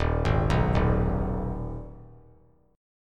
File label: Eb Suspended 4th Sharp 5th Eb 3C 3F 3A 3D; Ebsus4#5 arpeggiated; JGuitar